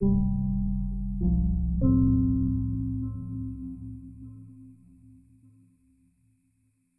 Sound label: Startup; Windows Never Released Soundpack 1